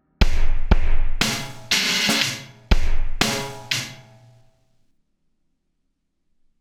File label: drums